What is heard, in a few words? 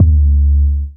BD, Dance Mega Drums, HIP